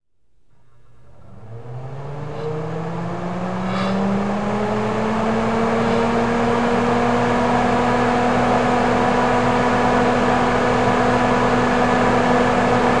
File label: FAN